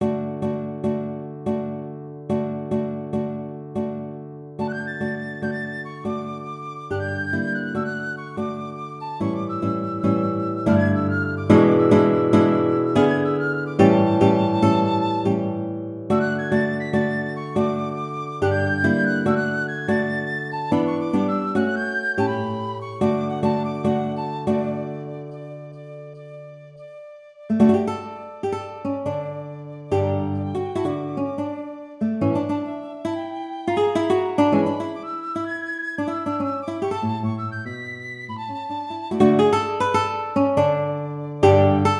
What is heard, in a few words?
romanian wedding